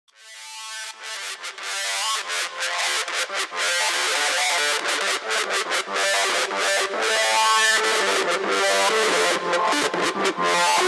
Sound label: funky mix ringtone